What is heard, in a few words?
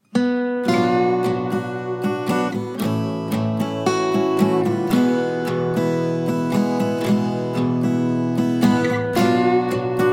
Arr. For Guitar